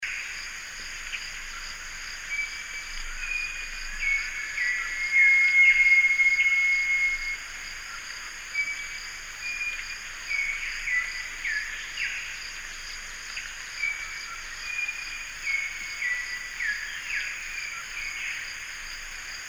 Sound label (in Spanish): manucodia ater canto sonidos